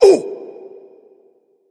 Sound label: grunt hurt; smite; voicepack; hun batz